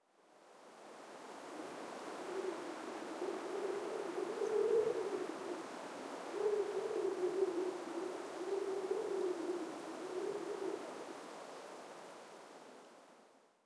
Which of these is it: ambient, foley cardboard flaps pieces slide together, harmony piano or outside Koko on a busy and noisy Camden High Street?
ambient